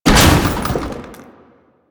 Common Military Crate Destroy; Objects; Warframe; Storage Container

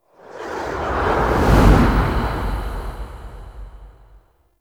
Romance Cin Outro Whoosh; Warframe; Tau Romance Cinematics

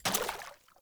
Water Step; Ambient; Sprout Farmers

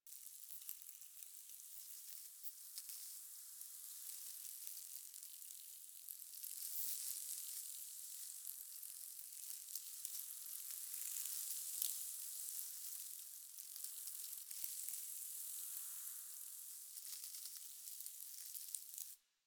Exterior Wind Debris Insect Loop; Warframe; Infested Eris; Ambience

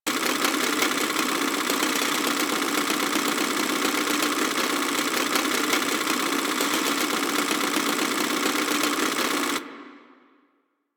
Rail Jack Ambient Machine Loop; Warframe

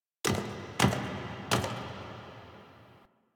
Steps F, Throne Room; The New War; Sentient; Ballas

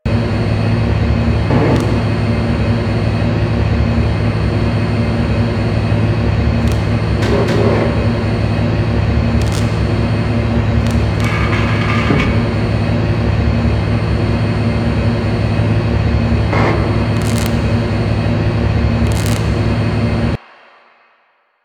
Vending Machine Idle Loop; Ambience; Props; Warframe; Nineteen Ninety Nine

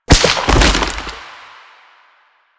Bunraku String Drop; Warframe; Koumei

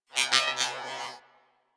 Beep Comby; Warframe; Ambience; Corpus; Beeps

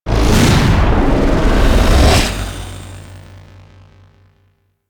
Portal Transition; Crp Exterior Gln Interior

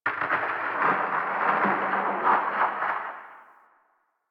Landscape Glacier Ice Distant Medium, Ambience; Warframe; Venus Open World; One Offs

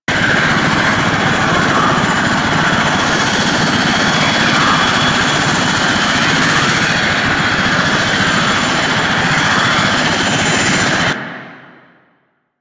Movement Engine Loop; Warframe; Vehicles; Archwing Demolition

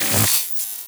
Key Stop; Enemies; Warframe; Captain Vor

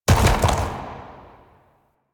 Unyielding Gallop Hooves Land; Warframe; Dagath

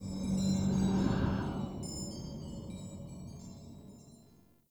Under City Ancient Wind

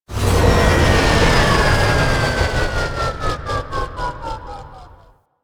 Crp Ship Showroom Ship Arrive A; Ambience; Props; Warframe; Corpus Ship Remaster

